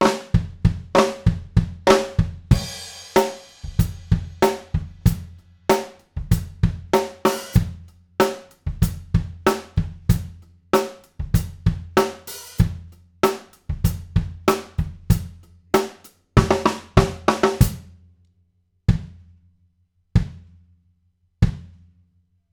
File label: Kick, drum; drum shootout